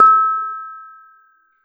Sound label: Bonang P, Gamelan